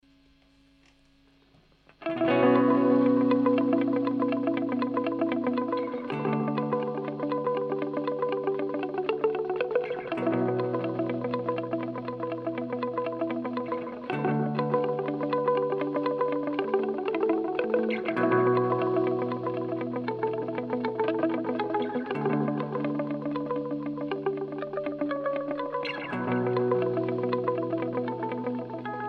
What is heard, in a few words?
Delay